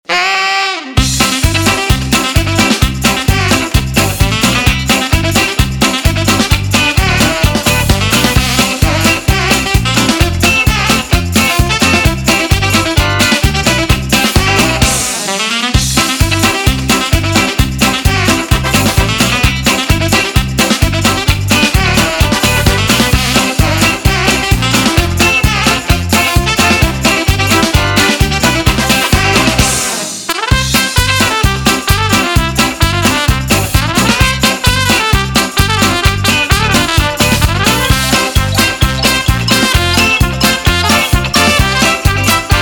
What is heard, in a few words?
funny running